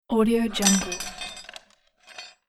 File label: heavy metal impact drop; برخورد فلزات